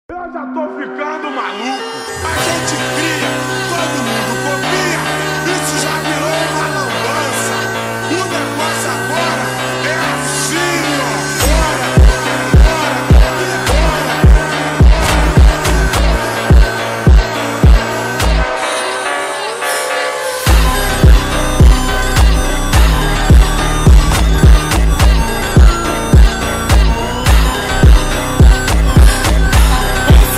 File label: slowed reverb